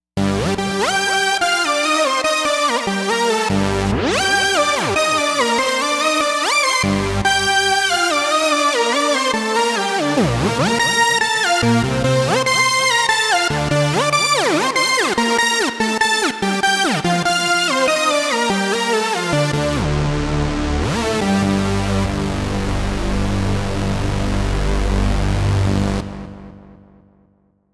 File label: stacked lead; Jupiter-80